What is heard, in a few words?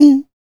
FEM PERC, Vocal Jazz FX